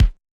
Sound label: Bass Drum H; Yamaha DJX-2B